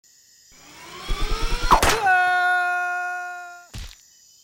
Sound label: Throw Henry